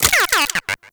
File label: Lure Move; Warframe; Teralyst; Vomvalyst Lure